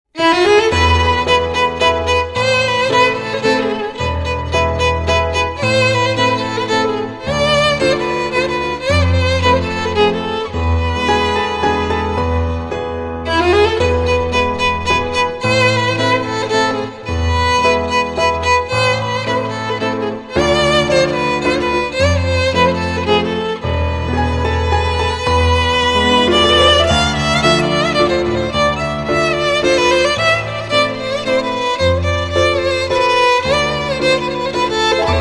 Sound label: Violon